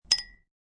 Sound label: Glass Clink, Two wine or champagne glasses clank during toast.; Bars; Toasts